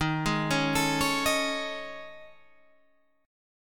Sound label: A Major 7th Flat 5th